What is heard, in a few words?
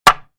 step